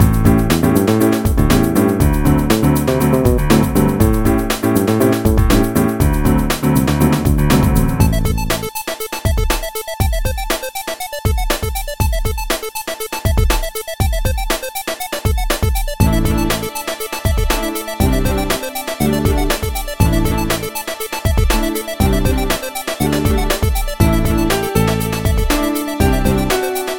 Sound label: Playground Main Loop